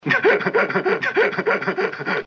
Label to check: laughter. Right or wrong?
Right